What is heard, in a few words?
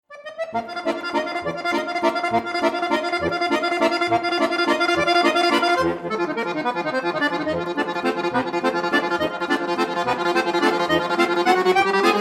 accordion